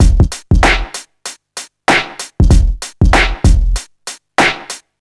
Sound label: 96 bpm breakbeat f key, kick tuned to the F note